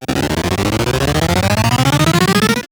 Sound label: Arcade Riffs